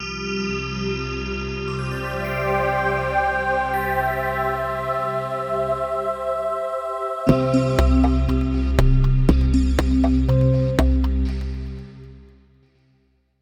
Signature Sound; FXHome; Signature Tracks

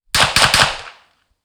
Beretta 93R 9mm 25m behind clean Triple shots; DPA4062

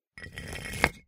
stone drag hit; Kenney's Sound Pack; Foley Sounds; Rocks

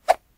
woosh; Kenney's Sound Pack; Foley Sounds

